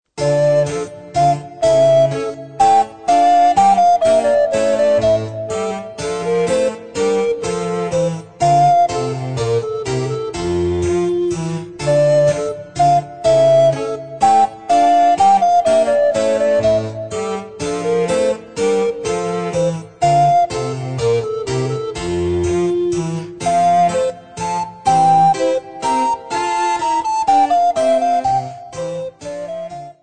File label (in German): Menuett